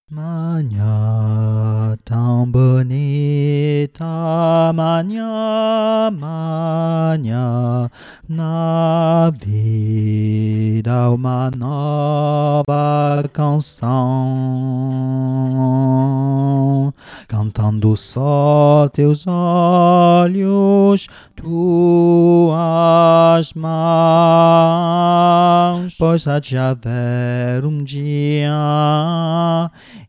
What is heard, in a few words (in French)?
basse VOIX